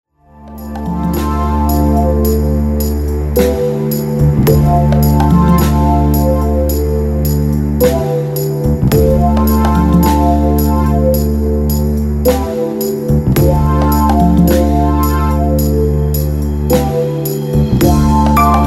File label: background music loop